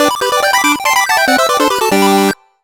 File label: (Jingle) Badge Catchers - Finish